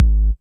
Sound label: Soft Kick; Vengeance Samples